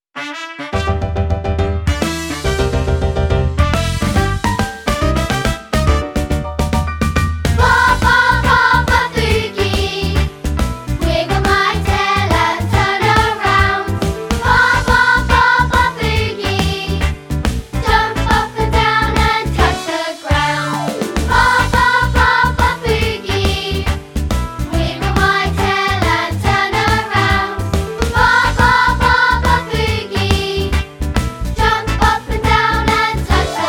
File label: Baa Baa Boogie Vocal